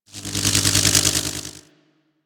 Magnetized; Warframe

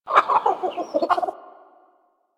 Bird Of Prey Vocal Shake A; Warframe

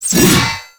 Backstab Impact Target; Warframe; Warframes; Pax Duviricus

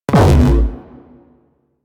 Rifle Projectile Pre Explosion; Weapons; Warframe; Corpus; Crp Ruban Rifle

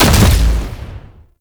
Infested Shotgun Projectile Explosion; Weapons

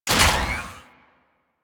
Fighter Platform Start, Ship; Ambience; Props; Warframe; Corpus Ship Remaster

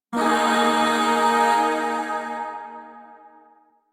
Shawzin Yo Chord H; Music; Chords; Warframe; Zariman Void Shawzin; DE

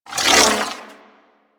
Proj Whiz; Warframe; Sentients; Dolicholyst

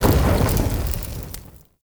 Fire Pillar Ignite; Objects

